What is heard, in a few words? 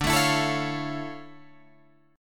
D Minor Major 9th D down Guitar Open D